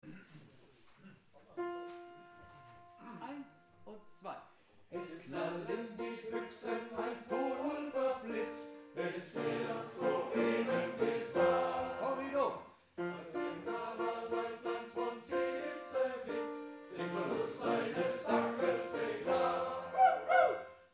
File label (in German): tutti